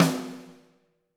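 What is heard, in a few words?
snare drum, Drums; Bob Clearmountain Drums I; SD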